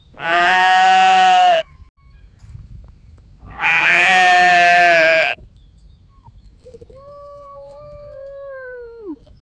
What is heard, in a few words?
goat bleating in fear; wildlife